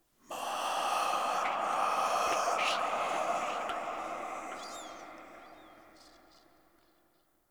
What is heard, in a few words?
Room Whisper; Ambience; Dante Unbound; Warframe; Entrati; Dead End Secret